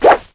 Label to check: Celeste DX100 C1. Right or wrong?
Wrong